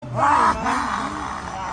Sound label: ahuaaaaaah; george floyd